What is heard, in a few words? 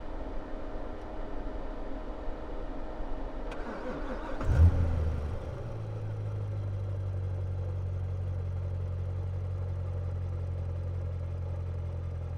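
Corvette C7 Onboard Start Idle Blips Steadies in Neutral Off Engine; Z06 2016; Pole Position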